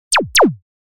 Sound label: explode